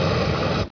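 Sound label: flamethrower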